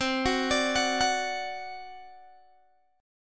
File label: C Suspended 2nd Flat 5th, Guitar, Open G; down; chord shape